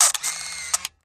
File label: 35mm Camera Auto Adv; shutters & movement